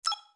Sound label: Beep; Star Trek